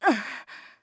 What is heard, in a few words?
damage, assassin voice